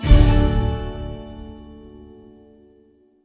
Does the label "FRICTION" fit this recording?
No